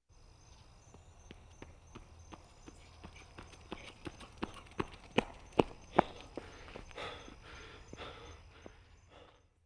jog pass, on foot; Media-Pedia

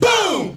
boom

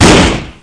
explo

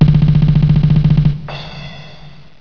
DRUMROLL

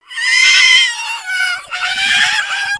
ON FIRE

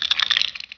idle, beetle; Heretic II

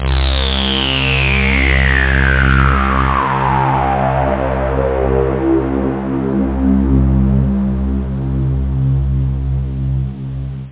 Synth-Mega-Sweep; Skwishy

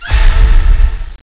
dramatic chord

